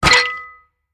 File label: Harvest node Seashell